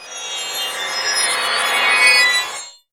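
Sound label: scrapers, percussion; Northstar; The Wizard VOL-1; POTION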